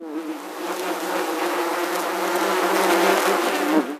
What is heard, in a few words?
bees; ambient; inferno